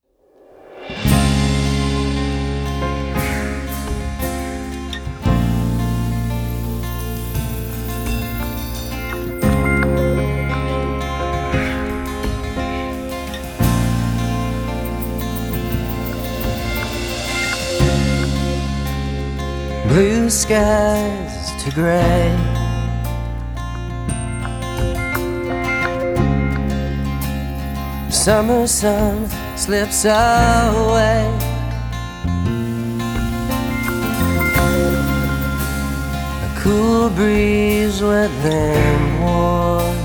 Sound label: Rain